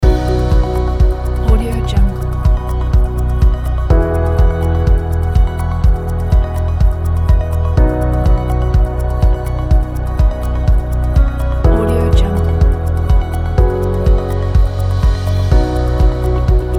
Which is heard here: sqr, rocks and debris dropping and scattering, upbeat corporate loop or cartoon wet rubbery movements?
upbeat corporate loop